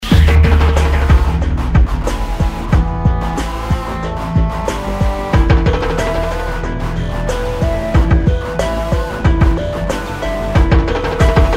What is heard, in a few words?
new TV ad music; Sky Bags Kissa kursi ka